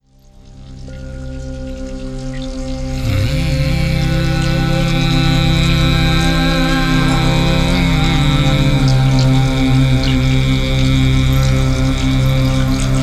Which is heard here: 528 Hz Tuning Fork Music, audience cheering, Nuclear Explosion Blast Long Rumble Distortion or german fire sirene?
528 Hz Tuning Fork Music